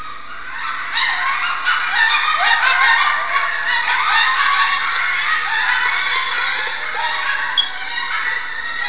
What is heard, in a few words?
coyote yipping